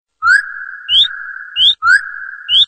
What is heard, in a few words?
cool whistel